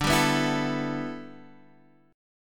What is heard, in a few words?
E Minor 11th D